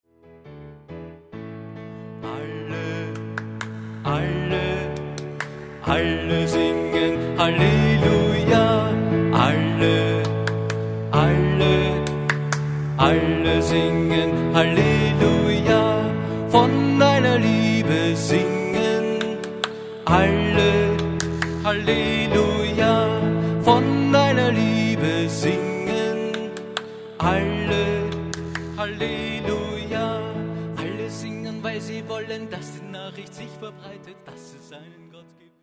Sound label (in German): Alle, alle, alle singen Halleluja (Clap-Version); erstkommunion; unbekannte Herkunft